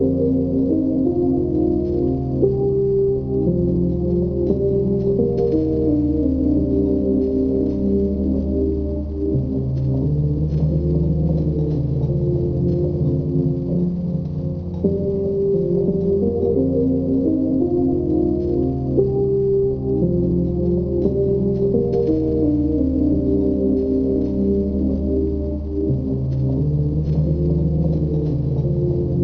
rain slow

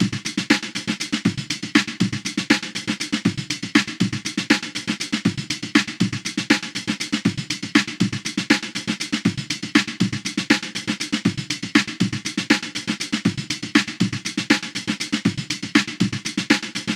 random beat 120bpm

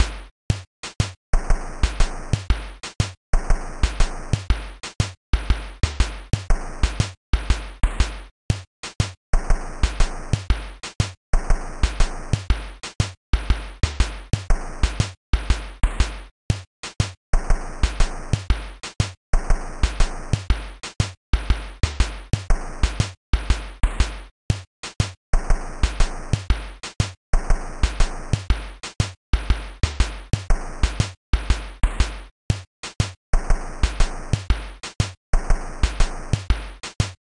drums

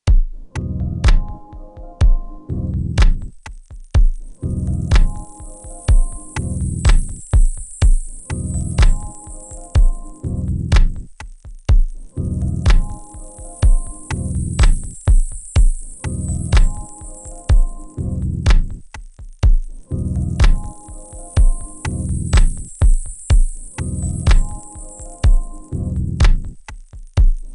loop

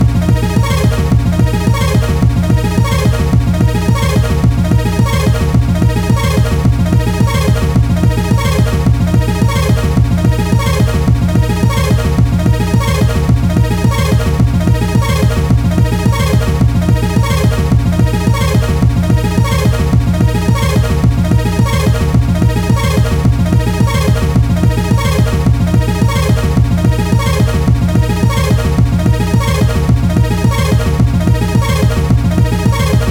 trance loop

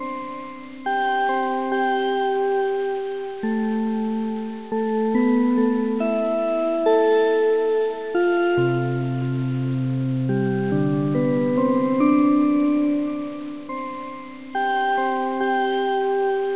Phonograph; GALAXY Town; witch

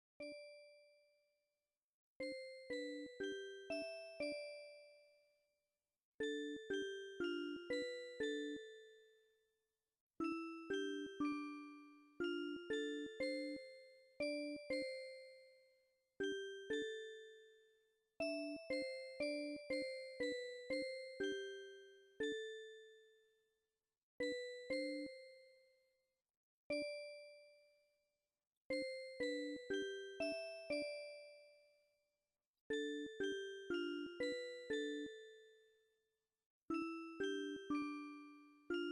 Electronic Sea

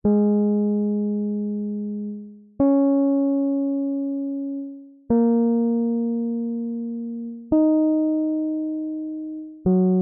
song

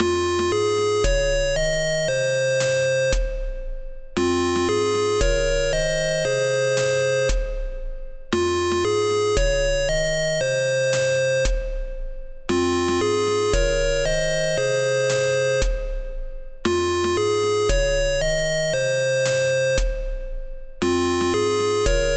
Alarma beat 80

